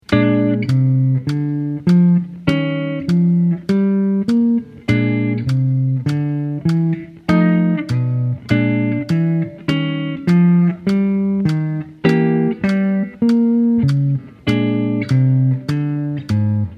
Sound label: Walking Bass Blues